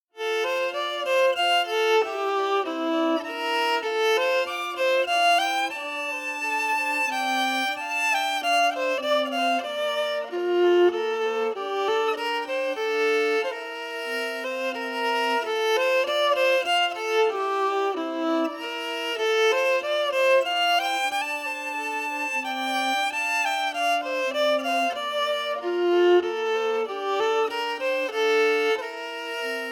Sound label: melody emphasized; Well Hall